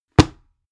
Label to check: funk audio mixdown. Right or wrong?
Wrong